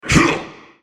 effort; Draven; SoulReaper

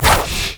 decoy swipe; LoL; monkeyking

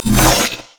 mystic shot; LoL; cyberezreal

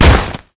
basicattack; Ziggs; snowday

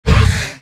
lesser wraith dying

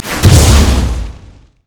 summoner spell base smite; LoL

